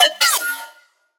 Synth

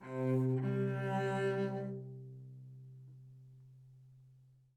live string phrases, string phrase Cmaj 100bpm

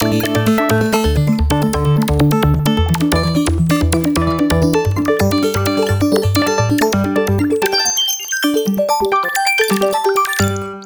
synth loop D 130 bpm; irk; base pack

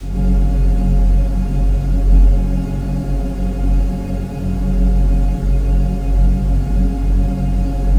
pad introductive Ab; synths pads; base pack

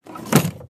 Closing briefcase, Foley; SFX; 7rystan's 600+ FREE EDM SAMPLES & DRUMS

